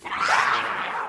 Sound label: wraith attack